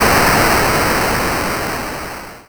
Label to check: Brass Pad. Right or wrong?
Wrong